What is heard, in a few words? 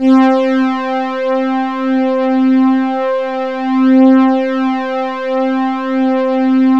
C3, ANALO STRING; Sound Gallery - Charged